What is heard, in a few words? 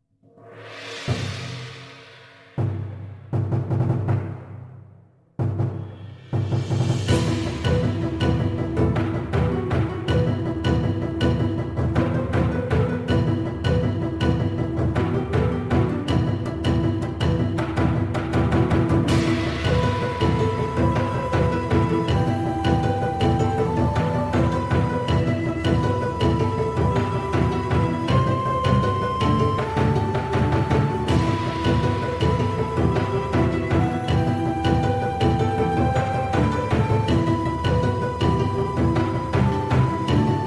Epic Japanese Music; epic ninja online; Shadow Ninja